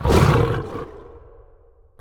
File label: manticore growl; smite